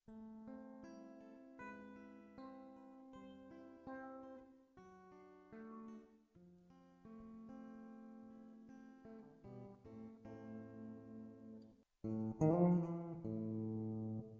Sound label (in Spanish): ruidos, guitarra